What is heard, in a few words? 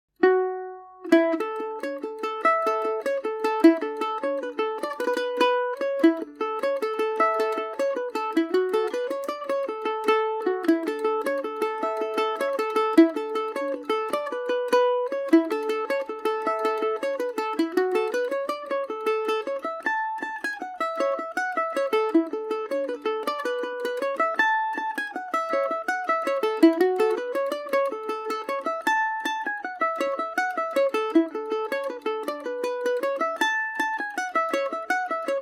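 A major paris swing, irish mandolin; Paris Swing Macaferri mandolin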